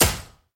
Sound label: Gun Shot; SoundsCrate